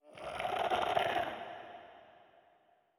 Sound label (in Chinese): 灵魂疾行声